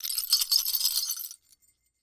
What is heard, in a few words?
Foley Bell Tiny Bells Rattle Fast